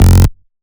Bass F, Bass Synth

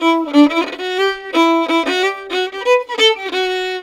violin, Celtic Flavours

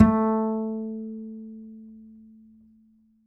Upright Acoustic Bass

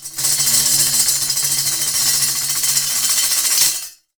DAF SHAKE; WorldSounds

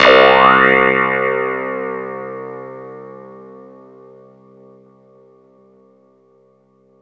CLAVINET; Ragga & Dub Reggae

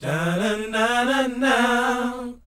BOYZ, Vocal, R&B Dance

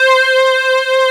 ATM WAVE C4, VO & BELLS; AKAI S6000 CD-ROM - Volume 1; SAMPLE WAVE MIXING 1

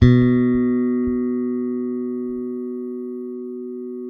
bass, sustain; Sampleheads; Will Lee Bass Library

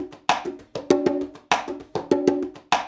LOOP, CONGAS, Percussion

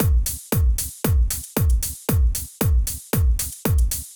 Drumloop 115bpm, Nu Disco; Zero-G

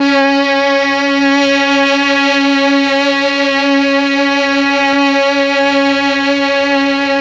MEL STR, Legendary M400; ILIO; Propeller Island

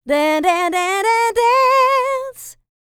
LICKS, Vocal R&B Dance; LT DANCE

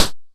Hats Ticky Hi Pedal, Modular; Wiard 300 Series

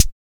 SRB600 BD

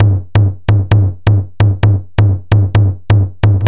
tom low; Music Store Sound Service; Einstein

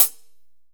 HAT, percussion reverb; MARKYDRUMS

